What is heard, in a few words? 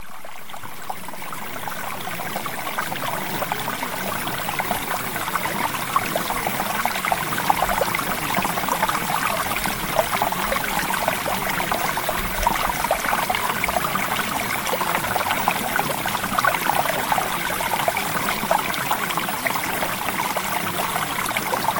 Water; Premium Subliminals